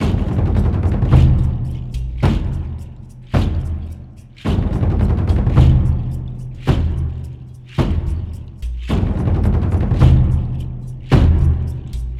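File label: Ambience Percussion Loop; underworld ascendant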